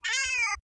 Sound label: meow; apollo